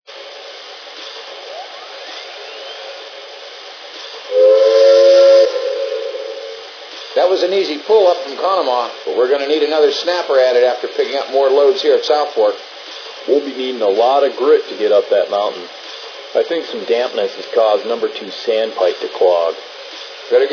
m1a

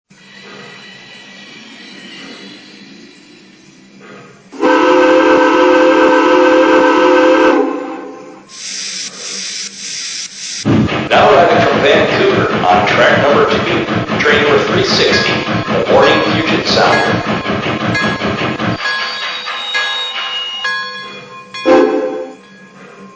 464 Hudson; GN

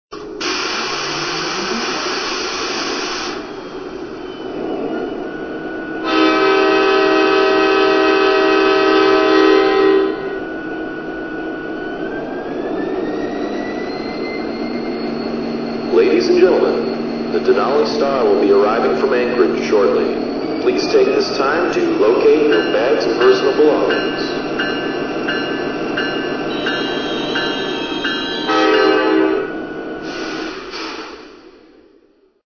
SD70Mac

